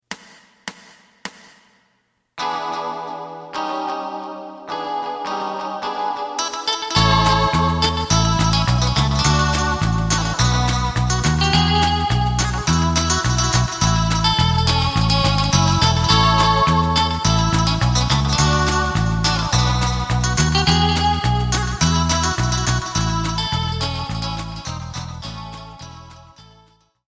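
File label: side guitar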